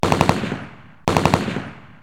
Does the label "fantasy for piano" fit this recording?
No